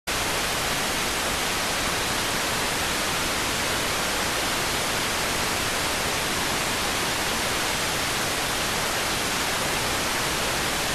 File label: rtty rx